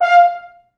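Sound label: French Horns staccato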